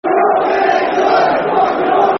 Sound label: Get Into Em, Fuck Em Up [Football Chants (Soccer Songs); fanchants; Ipswich Town Itfc